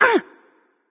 grunt jump light; smite; voicepack; hel

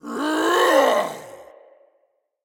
vox lunge; smite; odin

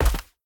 footstep; Smite; Tiamat

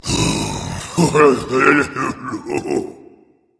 grumble berserker; cuchulainn

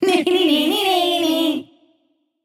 tongue; smite; skadi

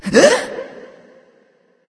breath; smite

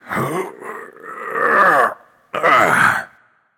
grunt lift; smite; geb

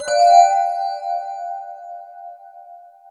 aoe; smite

